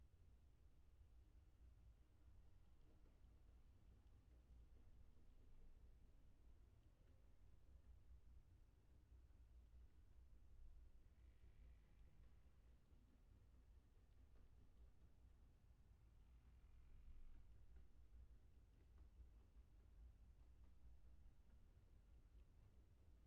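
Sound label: hydrophone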